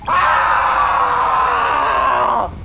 ahhhhh

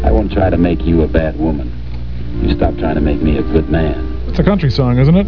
country song

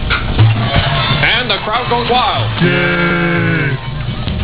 crowd

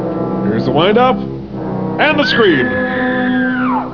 scream

